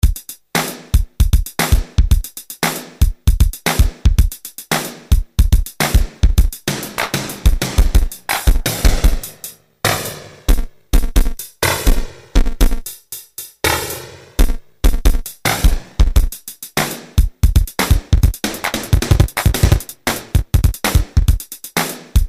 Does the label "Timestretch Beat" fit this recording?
Yes